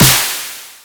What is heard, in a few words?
Snare, Dubstep One-Shots Drums; Ghosthack; AC2024 - Day 13 - Dubstep Sounds 2025; Grayness